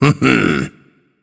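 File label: "heh heh", nonverbal; smite; voicepack; khepri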